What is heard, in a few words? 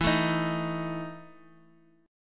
E Major 7th Suspended 4th E down; EM7sus4 strummed